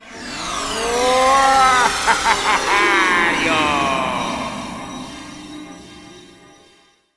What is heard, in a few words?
Warp Go; Wario Land Shake It